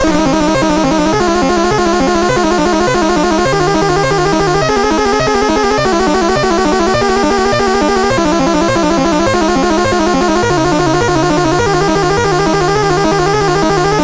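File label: River Chase, MOS 8580 SID chip music, games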